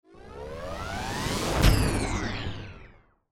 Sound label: Ascend Descend